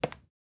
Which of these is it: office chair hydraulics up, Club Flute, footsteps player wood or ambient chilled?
footsteps player wood